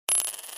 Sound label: coin drop spin